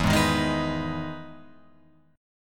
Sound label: E Major 7th E down Guitar Open F; EM7 strummed; JGuitar